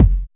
short disco kickdrum sound F key, Royality free bass drum one shot tuned to the F note; kick drum samples